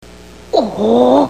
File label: ohhh